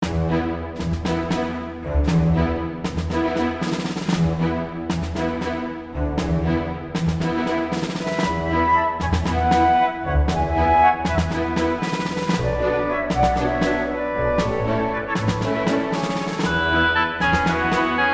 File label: bg battle